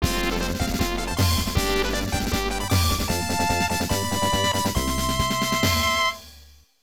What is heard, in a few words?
All Round Clear; Crayon Shin-chan Orato Asobo